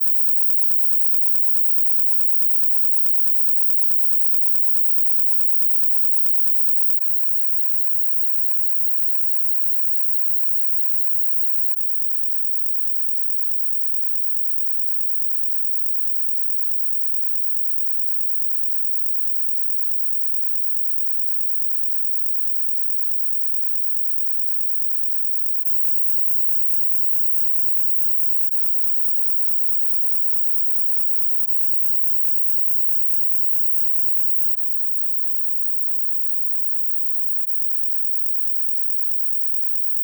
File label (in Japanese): 雅楽; 鯱の大祭典2025; 場内演出